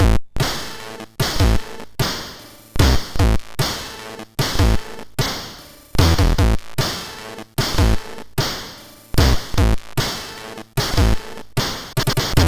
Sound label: MOS6581